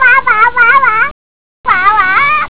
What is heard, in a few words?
baby